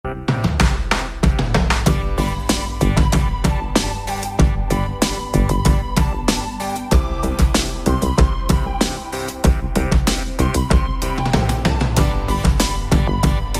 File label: happy